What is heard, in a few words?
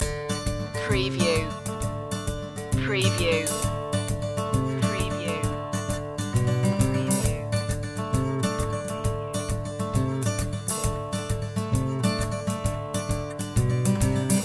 highway blues loop